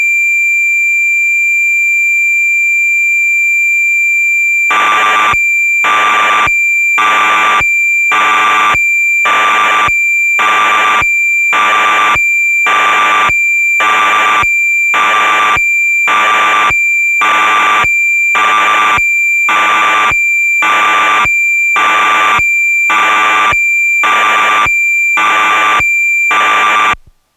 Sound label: Yamaha DX9 factory presets orchestral, Cassette Interface; Synthesizer Factory Patches